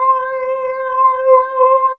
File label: jam synth, E keys